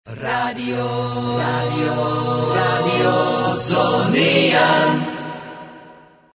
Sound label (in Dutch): Jingle; Zoniën